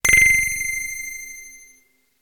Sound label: dropped; standard ringtones